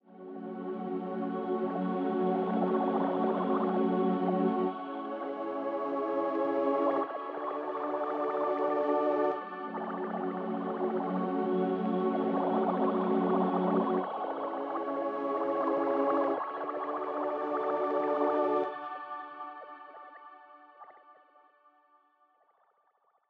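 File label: 103bpm Pad WET F minor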